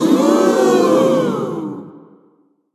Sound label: ooooh; running man; genki